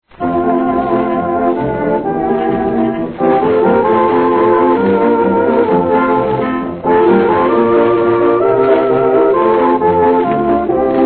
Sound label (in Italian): ORCHESTRA JAZZ SINFONICO; ANNO 1931